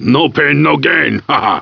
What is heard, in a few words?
PAIN